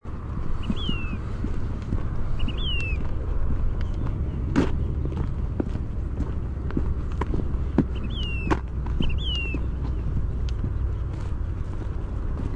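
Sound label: song, Say's phoebe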